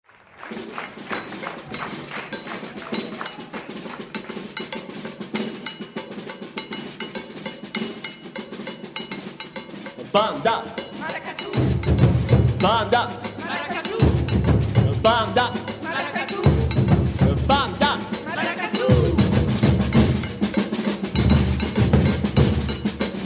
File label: maracatu